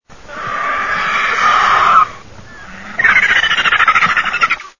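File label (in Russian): sip belogolovyjj, griffon vulture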